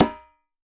WORLD DRUMS MIXED DRUMS

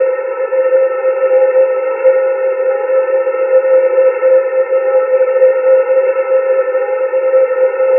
Drone Ambient; Drums & Drones

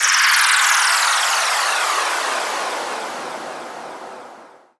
fx downlifter 150 soothing noise; Virtual Riot

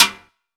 TIMBALES; AKAI S-Series CD-ROM Sound Library VOL-5

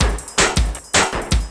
Drum & Bass, 160 BPM

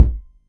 Kick, Roland MC09; Drums

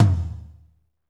tom, Jazz; Northstar - Drumscapes Roland

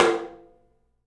open tom; 3D Sound; 3D Drums

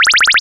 Blaster Fire, cartoon Foley Fx

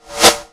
D3H-SNR; Zero G; Man Machine

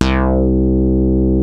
Moog synth; Rubber Chicken Software Sample Bank

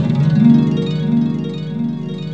Orchestra B5; Mo' Phatt; Digital Sound Factory Mo' Phatt

